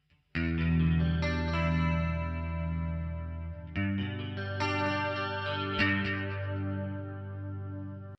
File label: clean